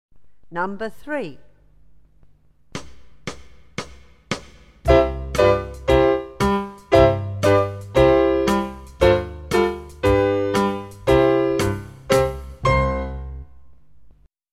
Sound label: primary tap straight taps